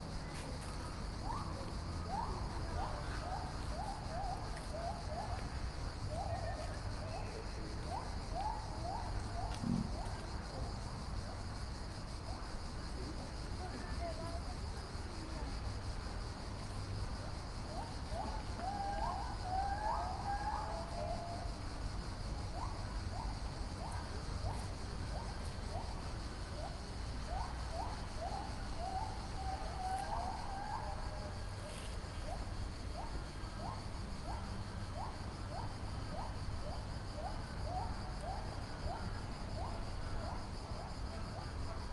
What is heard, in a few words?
zoo ambience close to emu and rhea enclosures; Sonniss GDC